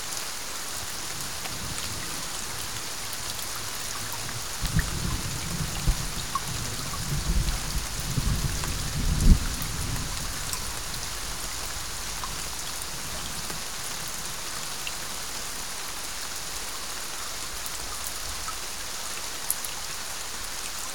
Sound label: Thunderstorm